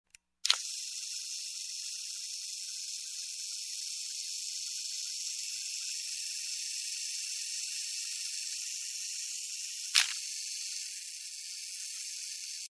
Camera Film Roll

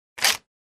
Pull off Receipt

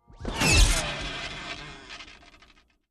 Electrical Shock Special FX

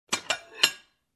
Open and closing ceramic pot

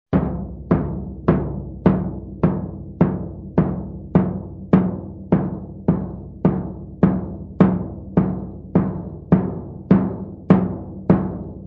Straight dull drum rhythm

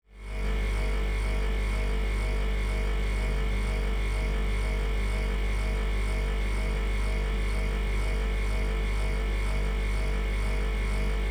Freezer humming

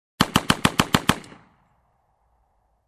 Slow machine gun shots

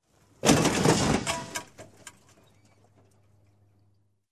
Car Crash with Scattered Debris Sound

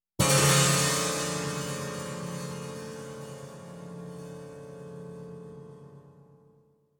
Horrific Scene guitar strum

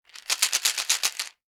Tablet bottle shake

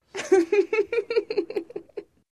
Feminine Laugh

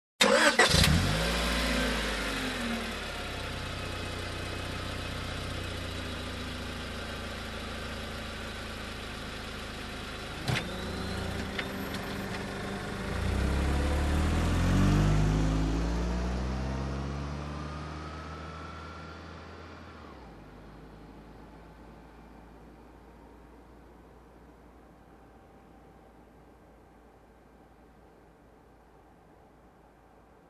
Car engine ignition and drive away

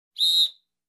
Traffic Officer Whistle Short

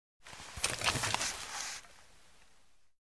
Crumbling off

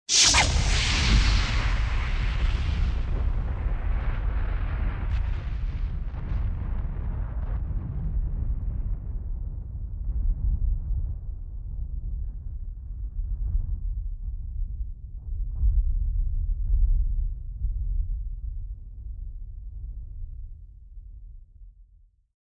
Huge Fire Light Up Whoosh

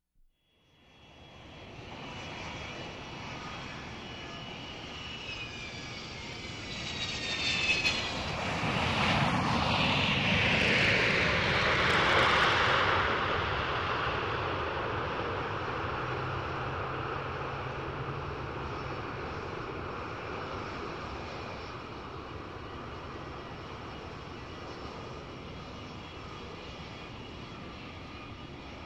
Aircraft Whirring Distant

